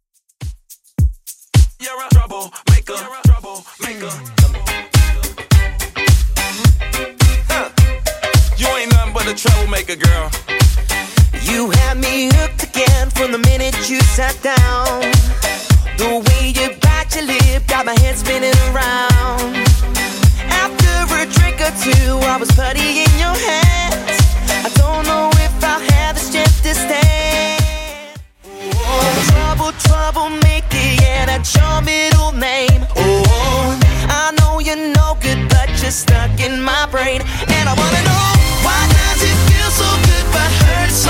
Top40 Redrum 106bpm

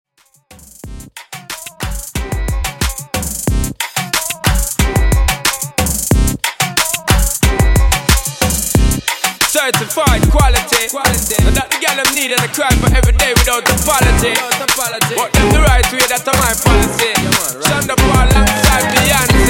RnB Moombah 91 Bpm

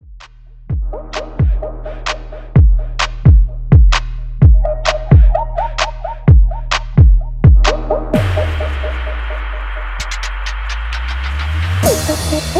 Party Drop Break Rework 129 BPM

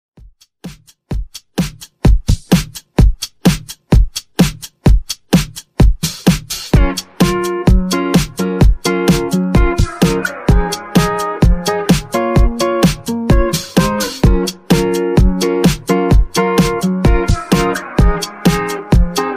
128 BPM

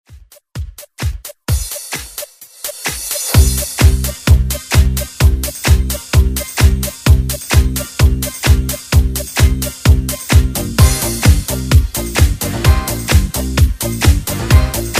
80s Dance Redrum

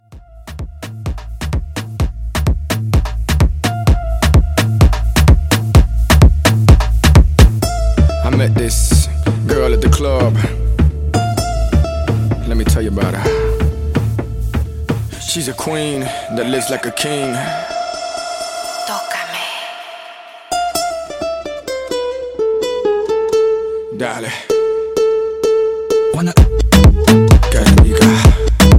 Afrobeat Re Drum

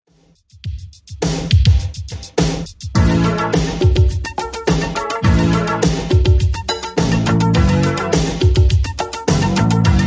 80s Dance 104bpm; Redrum

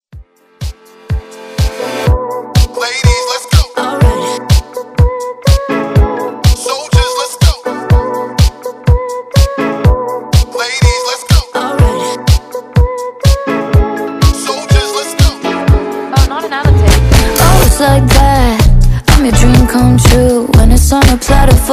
Dance Redrum

